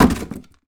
wall cardboard box; Golden Crayon Sounds

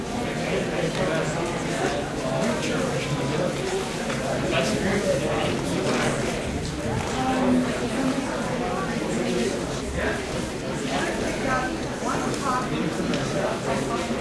school; Golden Crayon Sounds

